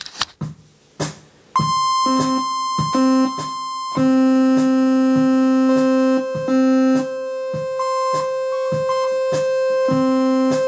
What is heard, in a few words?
Saw Lead